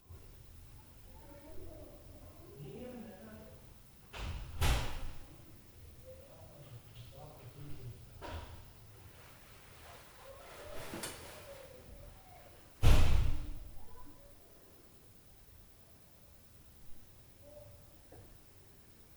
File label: Floor Speakers + 2x DOOR, Night Noise